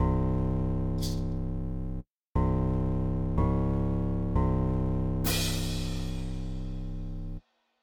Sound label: game over strange sounding, dark short music clips